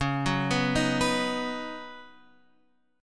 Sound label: Db 7th Suspended 2nd Suspended 4th Db, down, Guitar Half Step Down